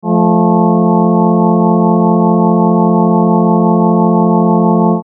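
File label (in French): accord tierce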